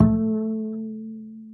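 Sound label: acoustic bass vibrato A 3, right; Masterbits CLIMAX 7 - Bass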